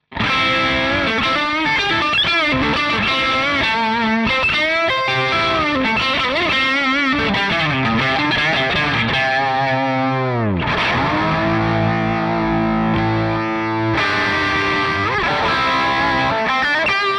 Crunch Warm Laser; Gojira Plug-In Suite

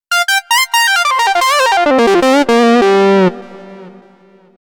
Yamaha Solo

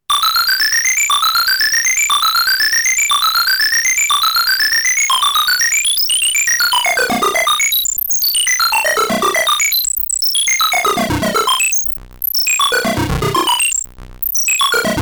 Moog Mother 32 Patch Arcade Machine

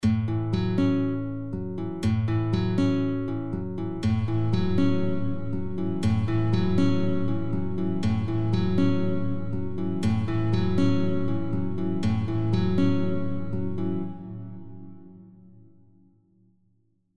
Lexicon Random Hall Guitar

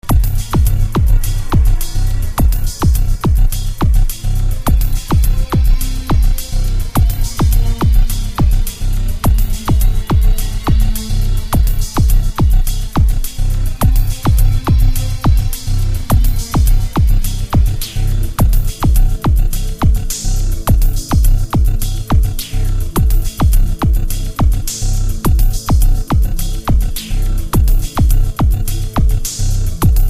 atmos

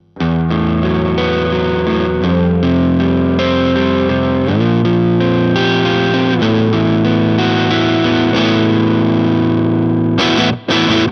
Music Man Mariposa crunch neck

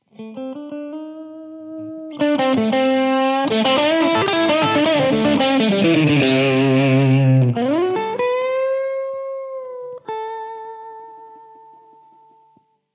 Lead Dynamics

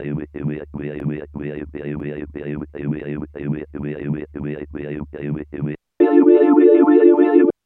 Talking; MODOR NF 1

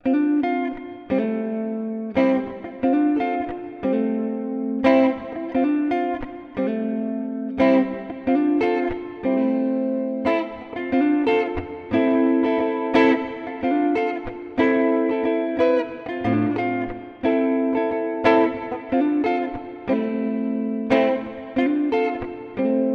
Plate; Golden Reverberator

